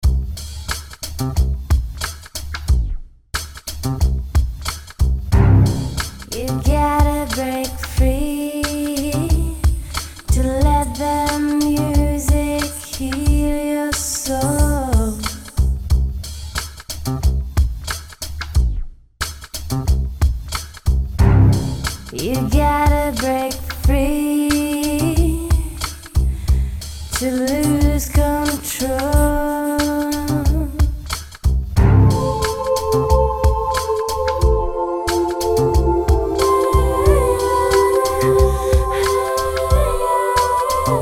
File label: Relaxing